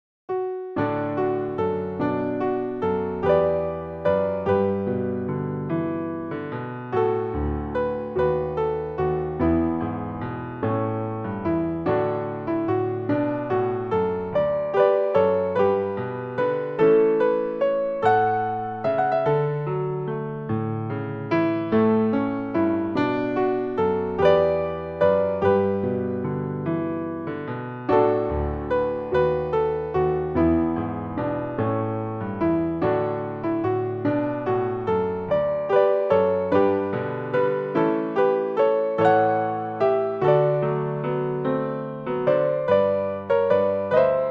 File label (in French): chansonnette; irlingham; nouveau ditty